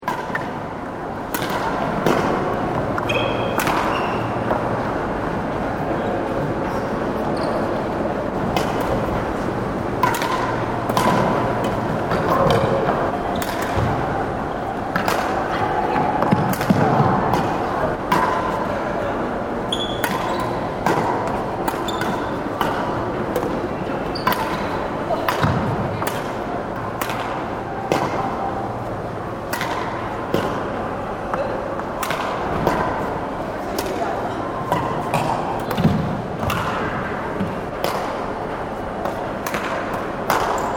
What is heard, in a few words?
Badminton, Ambience of badminton game inside sports centre.; Miscellaneous Sports (Sound Effects:Sports)